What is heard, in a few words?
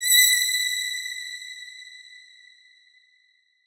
Fx 130, Techno; Techno Sounds 2024